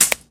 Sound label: Silencer impact